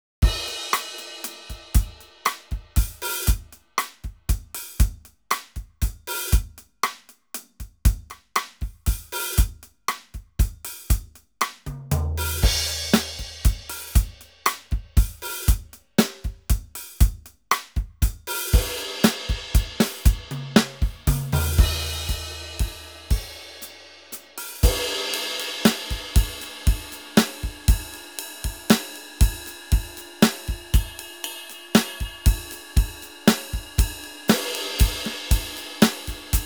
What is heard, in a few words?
efnote5 maple shells